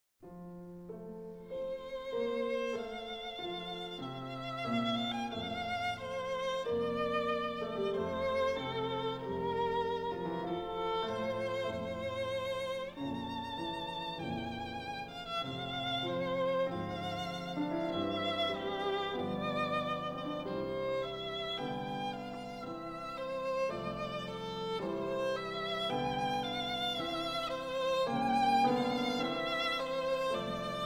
Violin Sonata No. 2 in A Major II. Andante Tranquillo - Vivace; Brahms